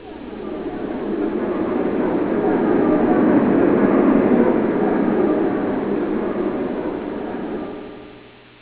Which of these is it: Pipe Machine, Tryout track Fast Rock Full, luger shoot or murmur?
murmur